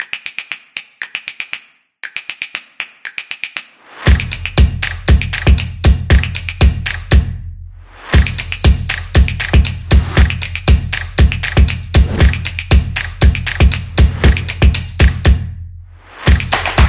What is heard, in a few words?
Be Drum; energetic percussion